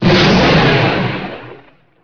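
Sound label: explosion gas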